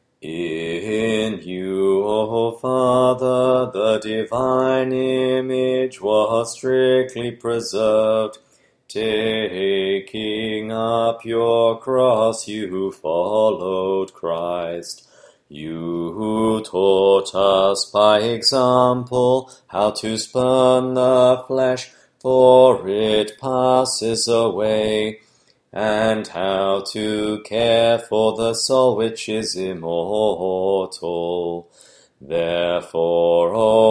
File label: Troparion Tone 8; Euthymius